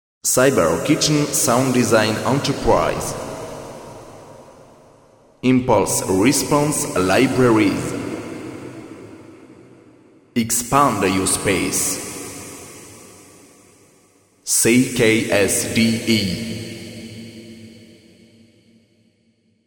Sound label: CONCRETE STONE